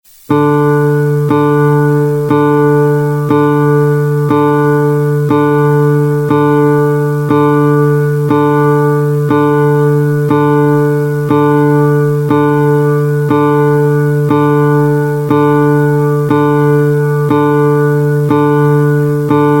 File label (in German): Gitarre Saite D